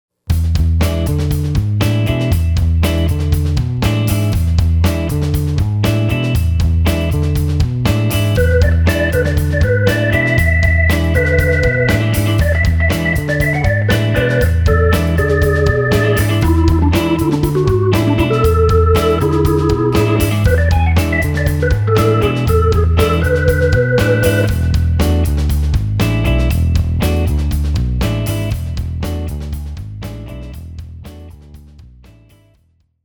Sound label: Swing